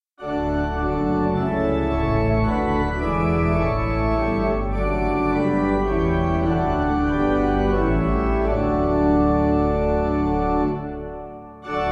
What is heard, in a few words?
Pipe